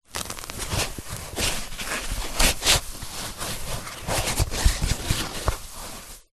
office packing foam panel bend handle movement

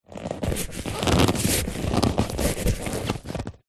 sport soccer ball handling rubs creaks

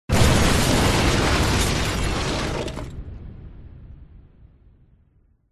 huge explosion; zapsplat

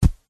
footstep single walking on thick fabric stomping; zapsplat

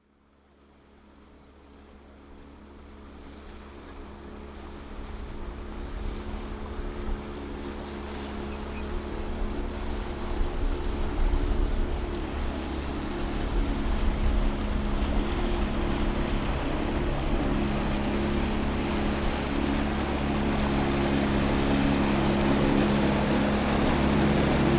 transport boat fishing small motor pass

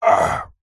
human male vocalisation pain ahhh; zapsplat

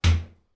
household bathroom clothes horse down on bath

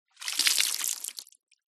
clothing item wet lift out water drips ground

